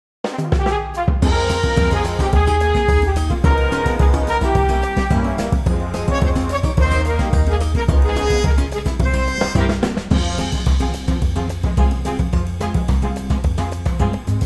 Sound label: Big Band